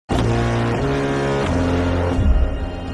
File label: bass sound effect; All Quiet on the Western Front; Simcity Jayplay